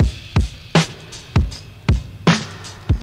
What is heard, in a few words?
sudden clap, Boombap Drum loops